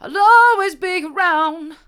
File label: i'll allways be around mid, vocal